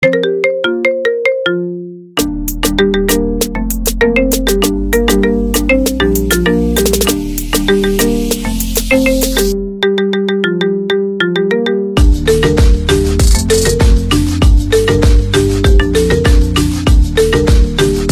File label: Marimba Remix